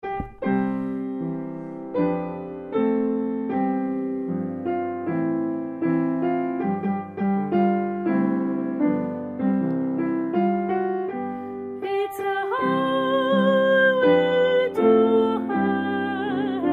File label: It's a highway to heaven soprano